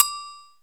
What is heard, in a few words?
Roland R-8 agogo; Internal